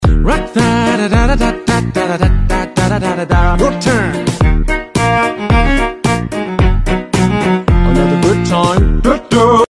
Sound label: Ringtone